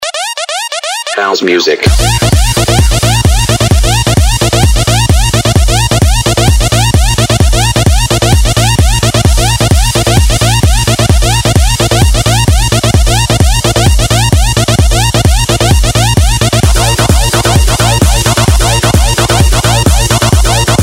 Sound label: house music